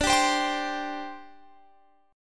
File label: D 7th Suspended 4th D Guitar Open F; strummed; chord